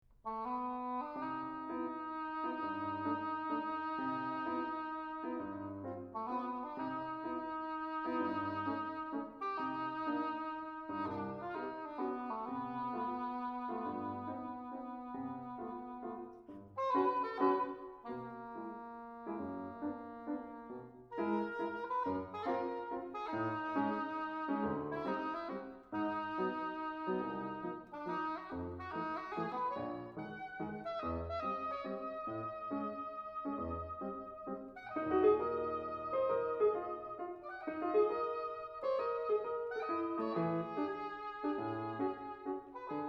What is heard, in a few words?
Quirky Waltz; Blezard